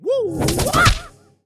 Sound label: Snake attack; Hero Monk